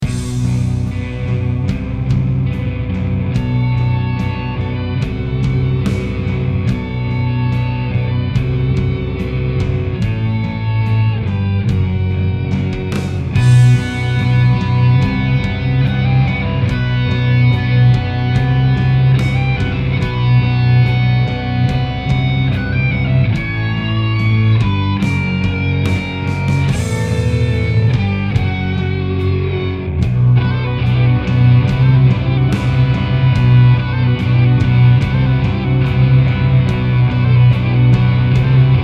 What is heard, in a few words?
tiny metal